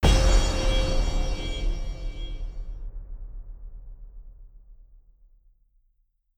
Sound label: Impact